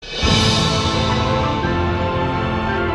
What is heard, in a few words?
piano